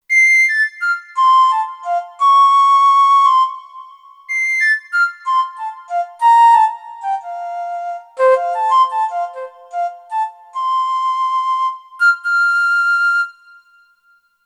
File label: Baroque Flute, Kurzweil K2500X; Factory Programs